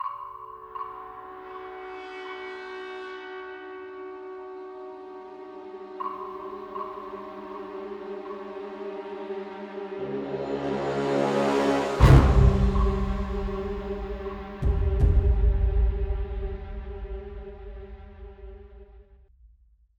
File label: Cresendo Hit; rambo the video game 2014